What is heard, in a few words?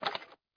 Chest Unlock; Learn to Fly 2